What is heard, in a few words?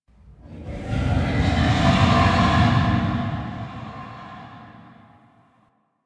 Growl Interior; Ambience; Unum Murex; Warframe; Quests; The New War; Cetus; Unum Tower